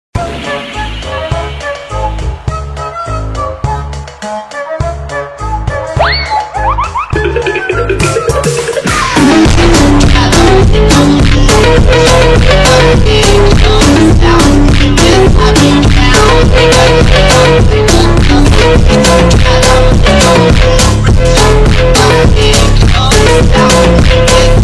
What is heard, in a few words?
phonk (Slowed+Reverb)